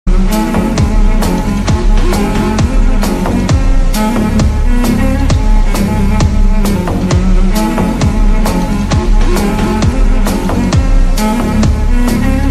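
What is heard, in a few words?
background music slowed reverb; rolex entry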